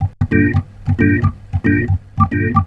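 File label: reggae organ #A; Best Service Advanced Media Trax Modern Composer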